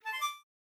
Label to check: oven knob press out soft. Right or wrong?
Wrong